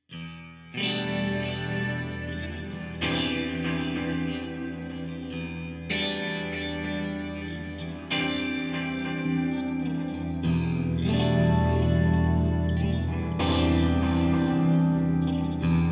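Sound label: Headrush Core Super Delay Chorus Clean Pickups; Div. Pickups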